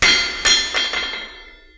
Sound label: metal; Mafie 1